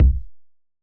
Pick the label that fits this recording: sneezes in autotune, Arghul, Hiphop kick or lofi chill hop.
Hiphop kick